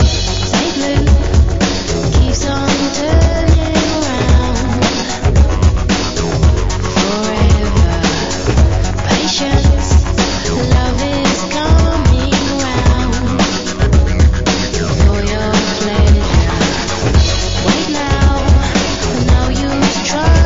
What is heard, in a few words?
random loop